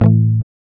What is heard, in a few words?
GUI A, Acoustic, Roland